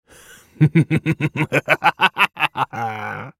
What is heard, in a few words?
laugh; VarusAscended